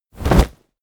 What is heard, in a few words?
bodydrop textile